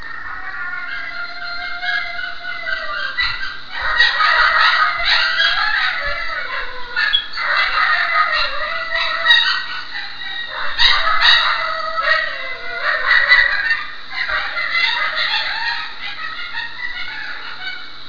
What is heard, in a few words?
long coyote